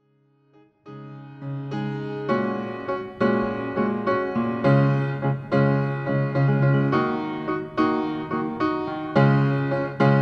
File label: drums piano synth violin